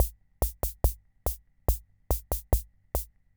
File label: Keynote MR-101 Auto Rhythm Loop; Keynote MR-101 Auto Rhythm Sample Pack